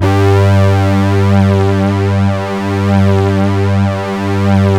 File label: SYNTH PAD; Sound Gallery - Charged; SYNTH PAD 1